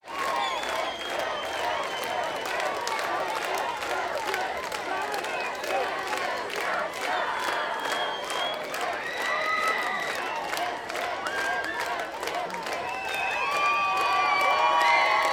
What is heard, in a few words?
Applause Long Concert Screamy